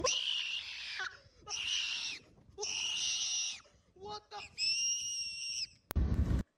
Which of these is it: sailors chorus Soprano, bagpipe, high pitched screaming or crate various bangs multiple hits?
high pitched screaming